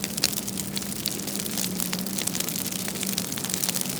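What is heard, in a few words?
fire medium; emitters global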